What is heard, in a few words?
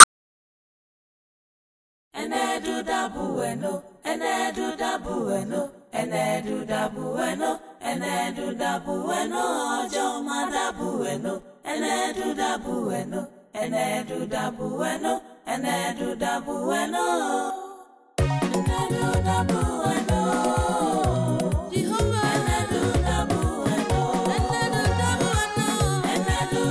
Calypso jam